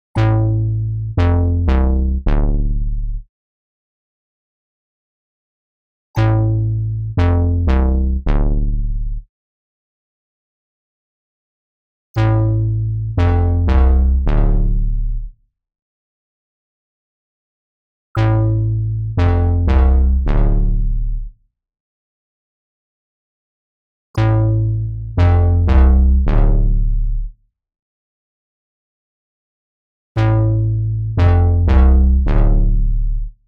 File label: Big Scream; Tverb; Repro 1; Chocolate Fluff